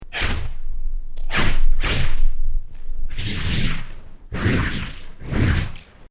Created Creature Medium Vocalise, Alien Sound Effects; Created creatures, vocalisations and pretend speech